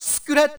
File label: scratch "hey" loud; HeavenStudioPlus; djSchool